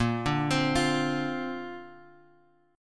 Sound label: Bb Major Add 9th, Bb, down, Guitar, Open F; Bbadd9 arpeggiated